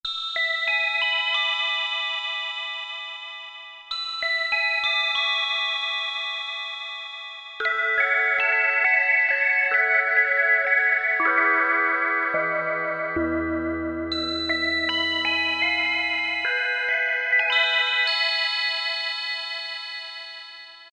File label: Gambang; Factory Presets Bank B